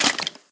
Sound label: step, skeleton; minecraft; mob